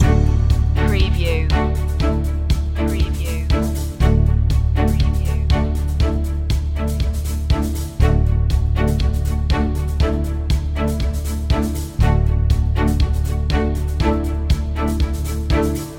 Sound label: corporate acoustic loop